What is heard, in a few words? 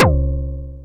Anasound Short to C#, Industrial Analog Single Notes; Sonic Foundry (Sony Creative Software)